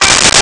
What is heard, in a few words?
Banjo G4; Nintendo; July 2020 Gigaleak